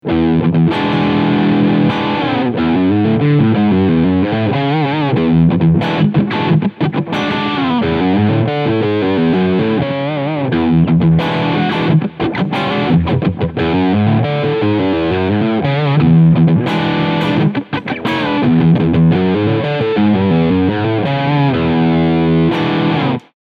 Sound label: ARPEGGIOLINES